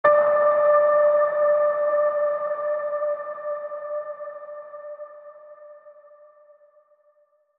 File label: Electro Ping